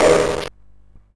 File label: ChipShop YM Vox Argh; CHIPSHOP DELUXE; CHIPSHOP HITS